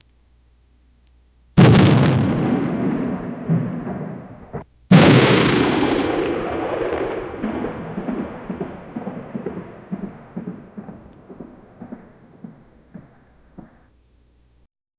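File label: several fighters can be heard, aviation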